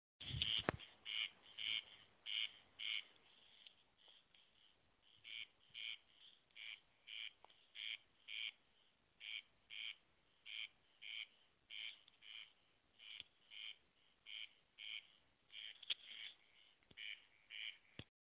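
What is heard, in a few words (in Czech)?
chrastal polni, corncrake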